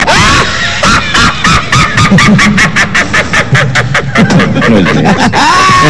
risada da bruxa

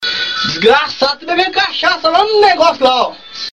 bebendo cachaça

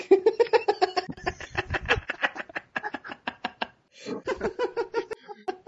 risada

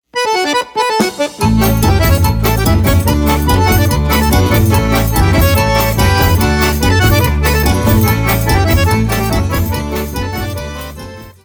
festa junina

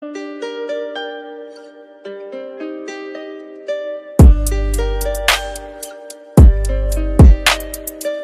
base de rap boom bap trap beat

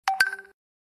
toque de notificação do whatsapp web

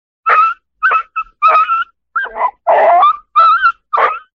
cachorro chorando

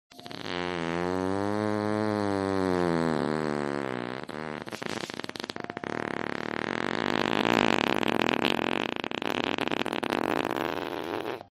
peido longoo

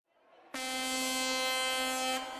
ao vivo na roda de funk

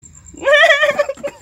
risada

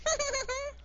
risada panda

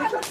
claque

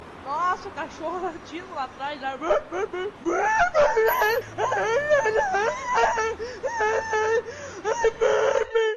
cachorro latindo la atras

